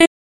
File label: Vocal Glitch